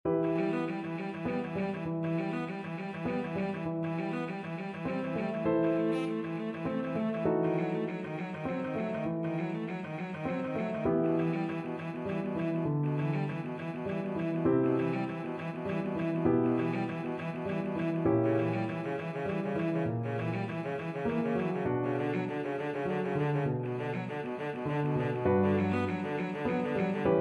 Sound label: bach, cello